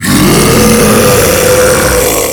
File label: Infested Ancient Taunt Scream; Warframe